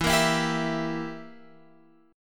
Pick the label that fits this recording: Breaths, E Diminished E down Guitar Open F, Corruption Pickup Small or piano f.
E Diminished E down Guitar Open F